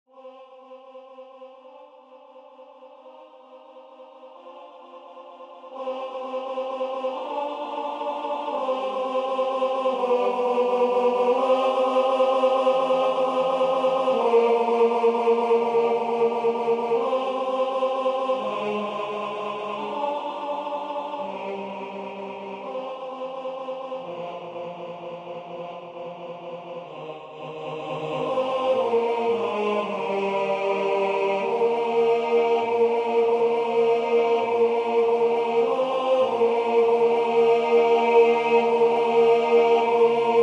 tenor; Alleluja

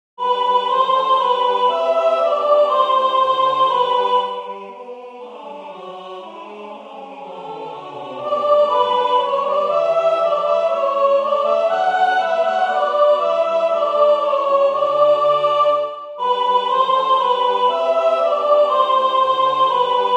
Jubilate Deo soprane; Mozart

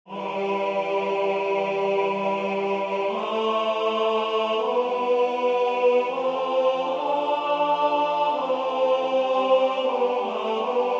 tenor; O bone Jesu; Ingegneri

